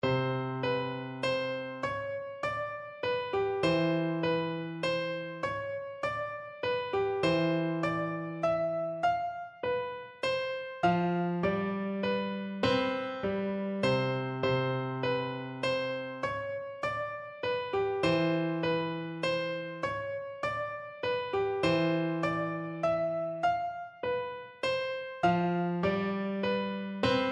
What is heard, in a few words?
Menuett in C, piano